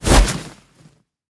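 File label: rustling packaging of canvas tent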